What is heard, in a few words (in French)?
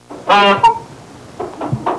paon